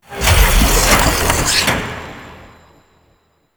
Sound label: Cursed Chest Unlock; Warframe; Duviri Murmur; Cave Combat Encounter